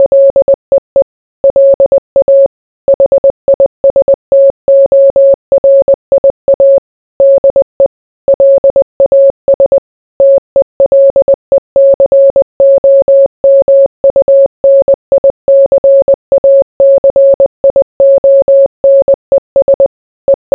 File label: morse